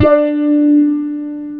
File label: WOW GT1-D3, WOW GUITAR; AKAI S-Series CD-ROM Sound Library VOL-7